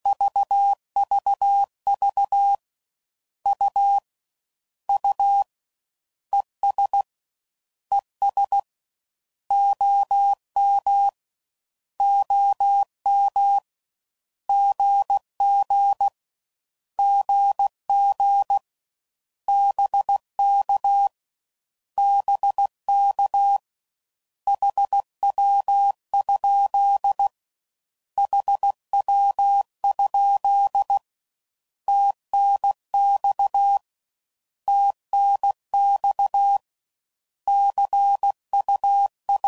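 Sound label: Abbreviations, CW Code Groups 15WPM; HamRadio; Morse Code; Mixed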